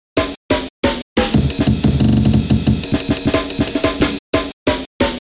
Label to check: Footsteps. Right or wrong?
Wrong